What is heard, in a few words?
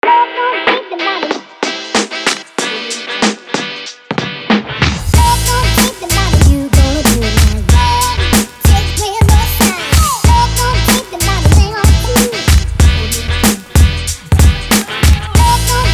hiphop